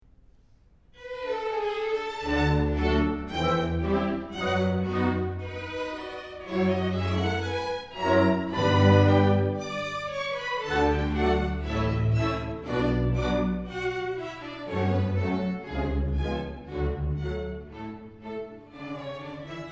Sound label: Symphony No. 1 in D major